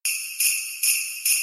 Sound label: sleigh bell